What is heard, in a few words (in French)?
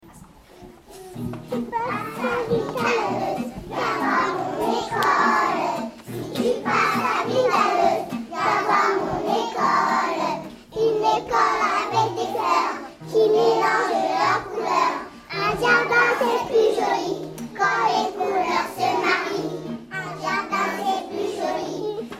chorale ecole; si tu passes a Villetaneuse; ecole quatremaire